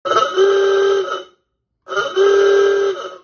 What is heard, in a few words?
ahoogah